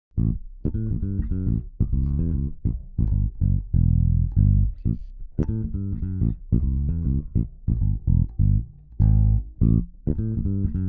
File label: Bass Brute Comp